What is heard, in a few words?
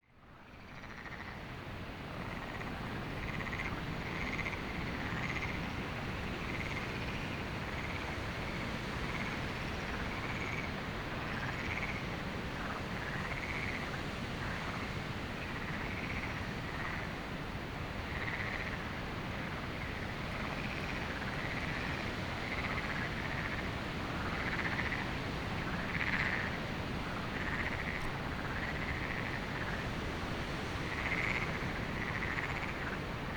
frogs wind night binaural; Lagoa do Canario